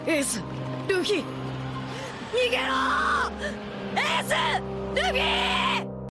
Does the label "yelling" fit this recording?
Yes